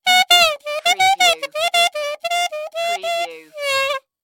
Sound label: Cartoon Clarinet Squeaky